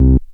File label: tone; invaders